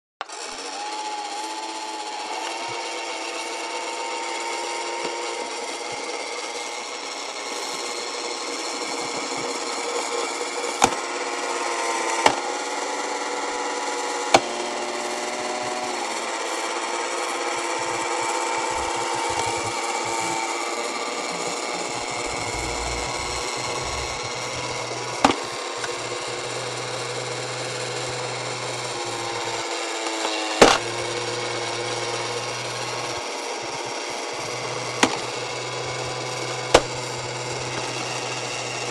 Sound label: Electric Motor Small